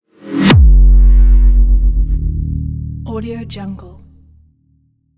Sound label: synthesized impact; Sinematic 2; soundmorph